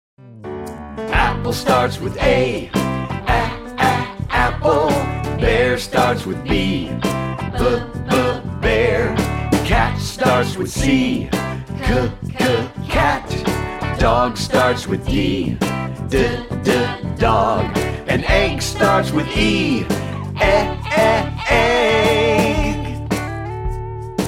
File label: abc phonics song; preschool learning fun